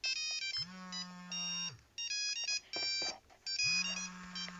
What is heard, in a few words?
nokia 5510 ringtones the buffoon